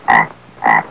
African frog croaking

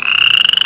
Toad ribbit

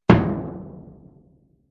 Hard low drum hit

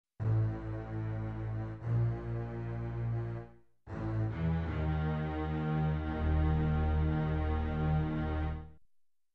Dark suspensful vintage horror orchestra

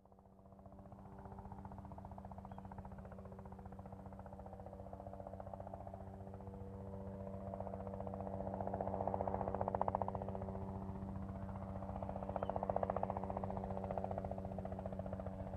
Helicopter fly over

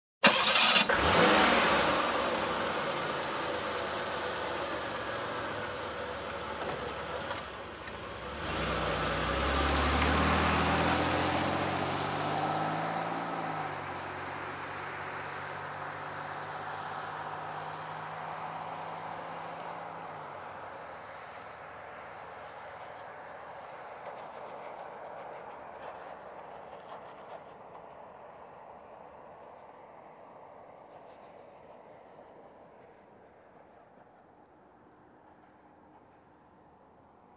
Car Engine ignition drive off

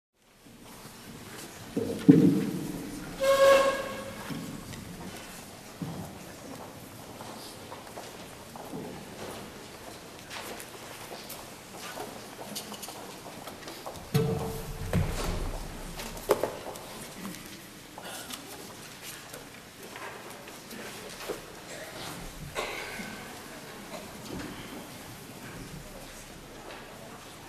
People coming in to a vacant pantry

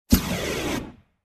Rocket exhaust

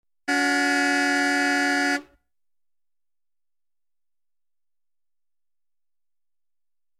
Sedan Car Honking

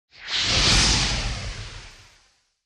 Big Fire light up woosh

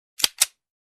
Pistol reload and slide pulling

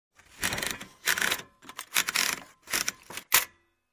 Torque Screwdriver

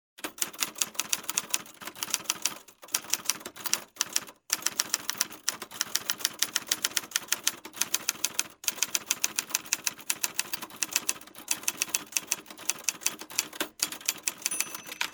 Typing on a typewriter

